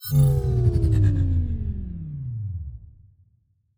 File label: cloaking deactivation button; Stellaris; first contact